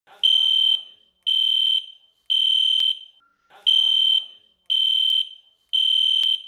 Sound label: fire alarm